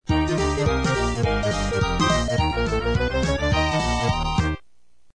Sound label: victory